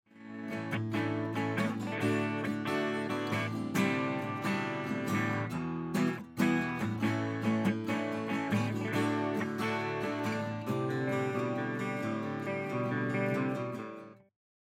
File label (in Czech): Kytary HP; high pass filter